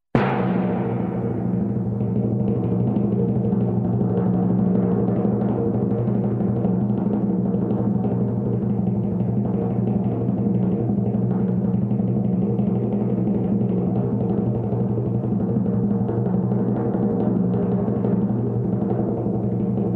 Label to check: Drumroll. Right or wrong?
Right